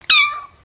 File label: Kitten Meow; creatures organic